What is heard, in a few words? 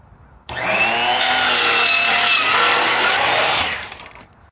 trim saw